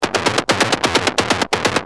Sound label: Dist, Industrial, Drums Perc